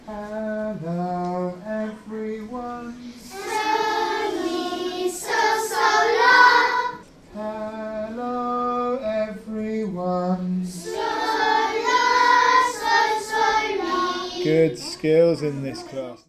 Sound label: Recognising Singing